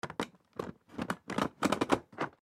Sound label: High Chair Insert, High Chair Insert Tray; Household